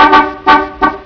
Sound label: car horn